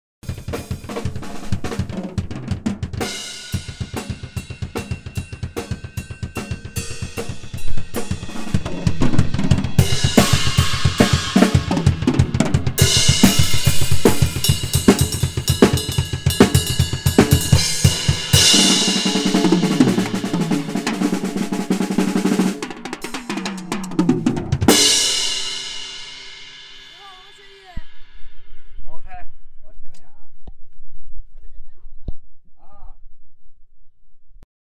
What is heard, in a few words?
drum